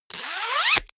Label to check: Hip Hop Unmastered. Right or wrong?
Wrong